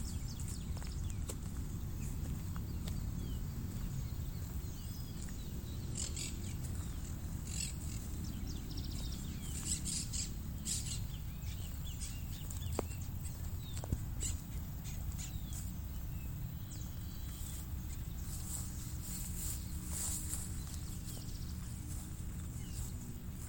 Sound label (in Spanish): Cotorra